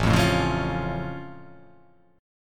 A Augmented A down

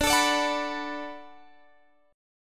D 6th D down Guitar Drop D, chord

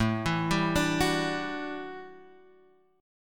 A 13th Suspended 4th A, guitar chord; arpeggiated

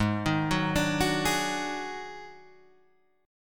D sharp Minor Major 7th Double Flat 5th G sharp

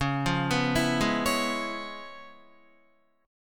Db 7th Sharp 9th Db, down, Guitar; arpeggiated; 4E,4A,5D,5G,5C,6F; JGuitar

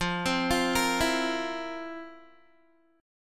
F Major 7th Suspended 4th F, Guitar; arpeggiated

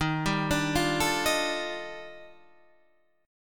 Eb Major Sharp 11th Eb Guitar Standard; EbM#11; arpeggiated; 11,10,12,10,10,11; JGuitar

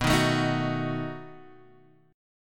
B 7th, B7 chord, Guitar Standard; B7 strummed; JGuitar

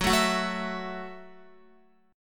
Gb Minor 7th, Guitar; Gbm7; strummed; x,9,9,11,9,12

